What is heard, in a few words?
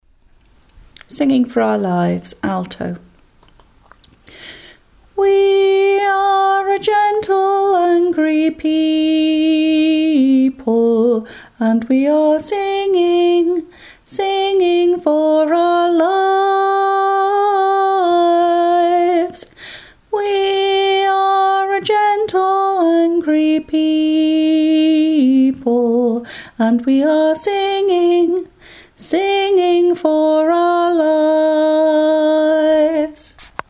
alto, choir